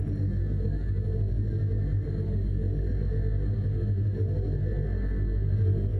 Tonal Re Draw, sparse soundscape

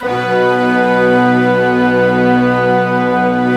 orch B2; AKAI S-Series CD-ROM Sound Library VOL-2

